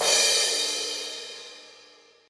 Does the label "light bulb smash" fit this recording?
No